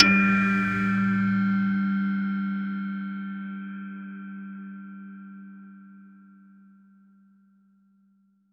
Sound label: solid antique woo